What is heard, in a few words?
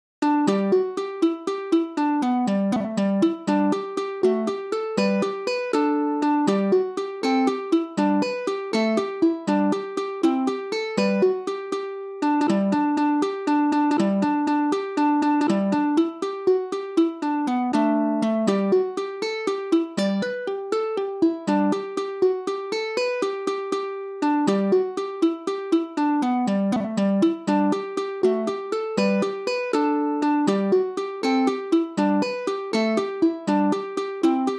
Jig; Black Thorn Jig; Woodshed